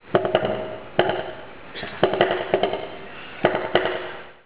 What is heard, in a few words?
weird sound